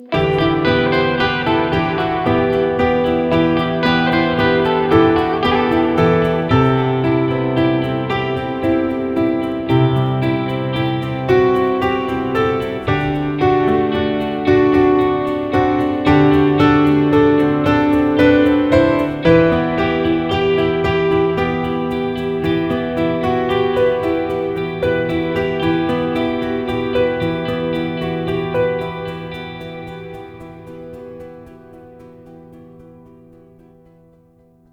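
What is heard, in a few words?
dramatic guitar clean version; by burak sound bank; dramatic dram vol 2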